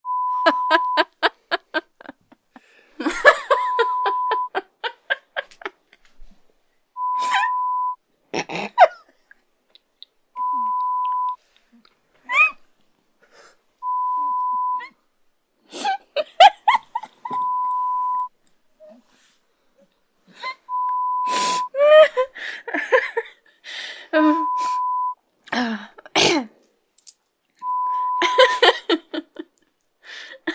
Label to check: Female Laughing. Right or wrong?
Right